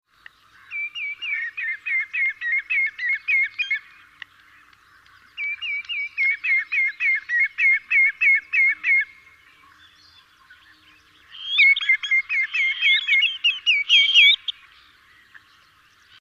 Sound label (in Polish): kwokacz Tringa nebularia